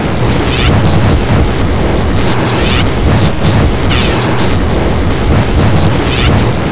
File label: blitz save; clockworkpi; Indie Games; Hurrican